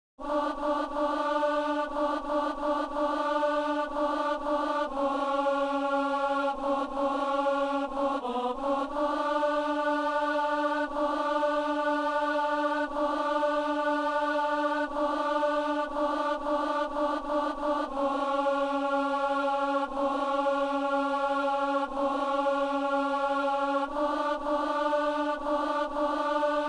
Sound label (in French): alto; Messe du 17 janvier à Saint-Denis